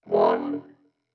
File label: 120 Neo-Wave Vocoder; Pop Rock